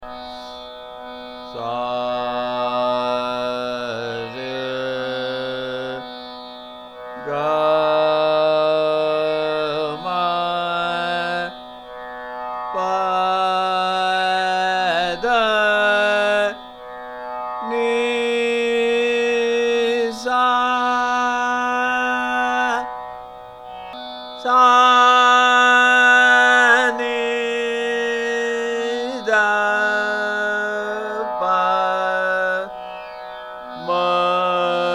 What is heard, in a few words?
Puriya Kalyan Aaroh Avroh